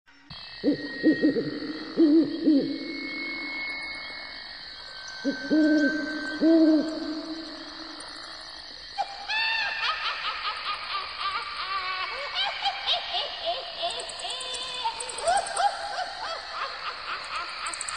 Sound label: owl sound; healing-wish